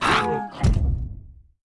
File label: Ambicorn Dead